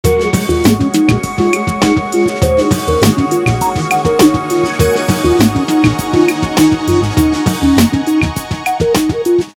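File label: lounge, ringtone; Motorola A1000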